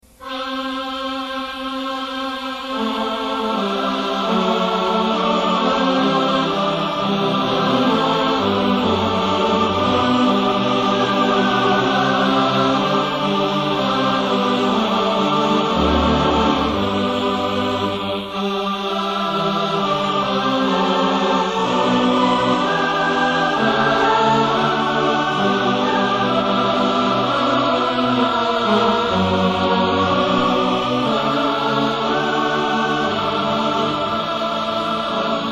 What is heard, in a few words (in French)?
kurzweil 250 choeurs